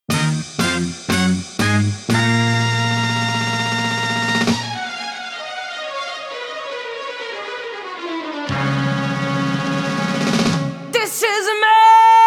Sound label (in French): DEMO CHANT